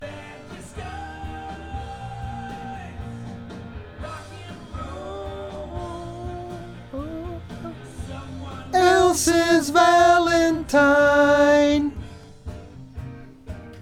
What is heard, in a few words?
VOX Back